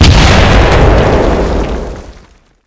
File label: hegrenade explode; cstrike; grenades